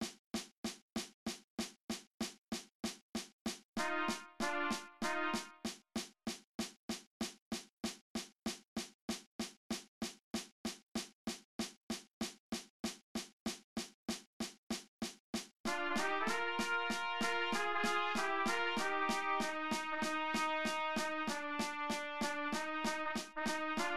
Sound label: Just a Closer Walk (cut time) trumpet 2 and 3; senior high band